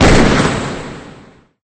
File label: shotgun fire; hedgewars